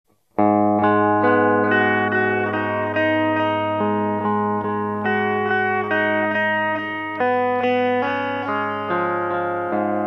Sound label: Am7 Am7 D Dm